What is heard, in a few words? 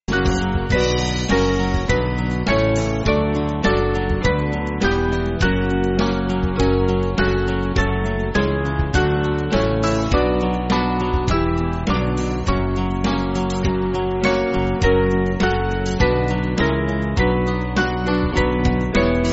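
Band, Music Backing Files for Churches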